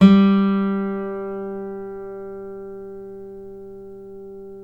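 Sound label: GTR G3 AC; Synclavier Sampler Library; KEYS AND GUITARS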